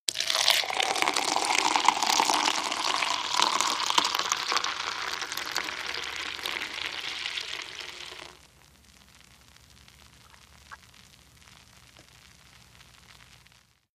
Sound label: Beer Pour Classic, eating & drinking